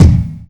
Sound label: 00s bass drum a sharp key